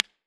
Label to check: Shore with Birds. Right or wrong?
Wrong